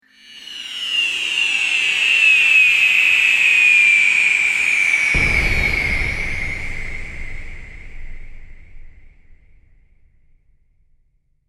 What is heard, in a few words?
Spaceship Crash, Futuristic sound of crashing UFO; Spacecrafts